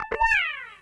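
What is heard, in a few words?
guitar; Zero G; Man Machine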